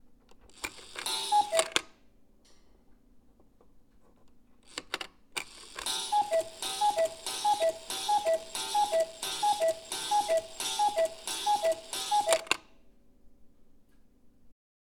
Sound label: Cuckoo Clock; Trevor Webb